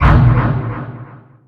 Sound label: Menu Horror